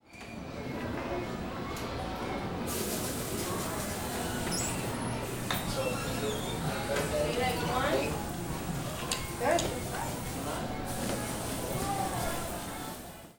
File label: mall, ambience